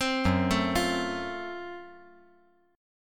F sharp Minor Major 7th Flat 5th F sharp down

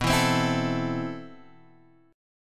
C 6th B, C6/B chord; C6/B strummed

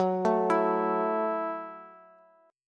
F sharp Minor 7th F sharp, Bass

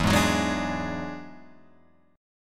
E 9th E down Guitar Open F; E9 strummed; JGuitar

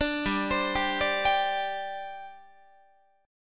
G Suspended 4th G down Guitar; Gsus4 arpeggiated; 17,15,15,17,18,18

